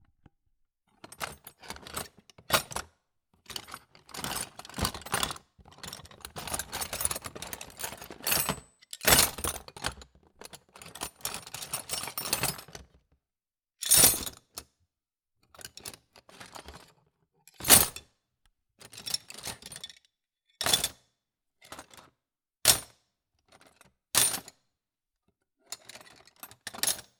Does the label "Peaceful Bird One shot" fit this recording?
No